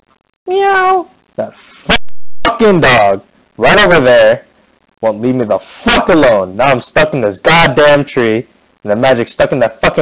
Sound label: cat